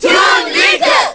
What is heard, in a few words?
Cheer Korean; Toon Link; SSBB